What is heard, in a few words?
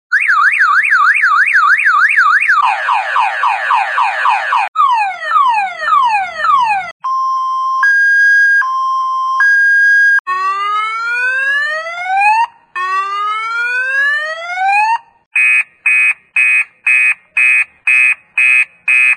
Car Alarm